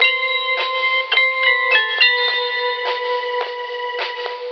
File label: keys, jazz loops